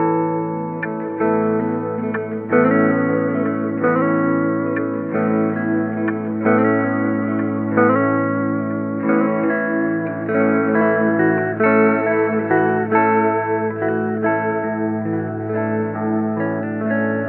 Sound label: Psilosonic Guitar Haze; Sonic Foundry (Sony Creative Software); Ma Ja Le Ethereal Textures